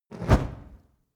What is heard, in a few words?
fire torch impact; swooshes-swishes